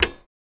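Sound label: Sony Camera 5850 Pause, buttons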